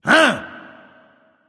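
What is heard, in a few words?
nonverbal surprised; smite; voicepack; ullr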